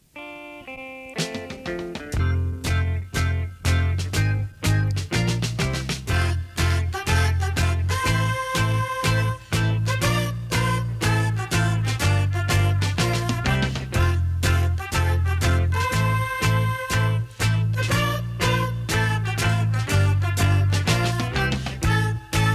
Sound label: Instrumental